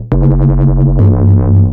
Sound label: bass 138 F#, bass-licks, Techno; instrument-loops